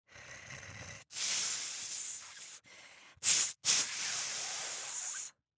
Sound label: Spider Creature